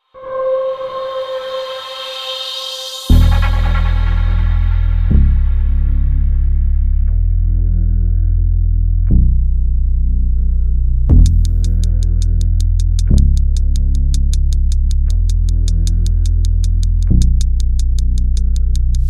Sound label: Phonk